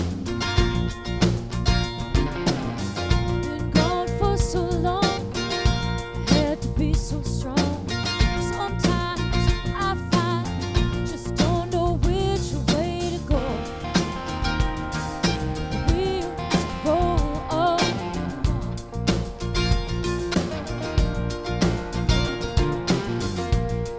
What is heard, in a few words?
Mandolin Tape; Kramer Tape